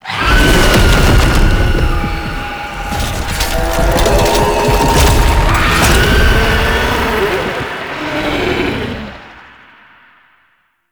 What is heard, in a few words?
Phase Transition; Tau; Twelve Minute War; Dactolyst